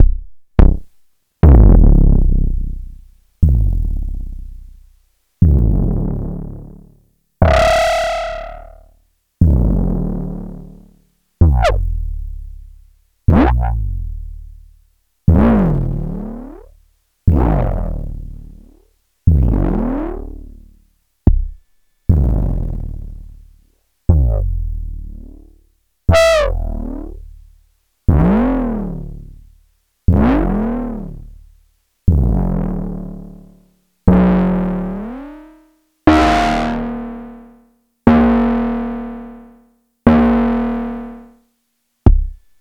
Kick Distorted Feedback; Sliced

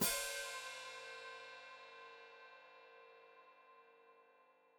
Hat Closed Open, Hi-Hat

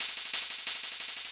LOOP -L, Drum & Bass, 180 BPM

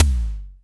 BD, Bassdrums, Roland TD-20

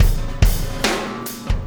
Impact Crater Beat, Processed Drumkits

